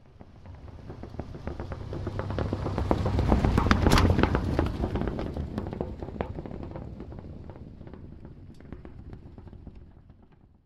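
mashyna s prob koles proehala, car passing with punctured tire; Звуки спускающего колеса от прокола и движение машины на спущенном колесе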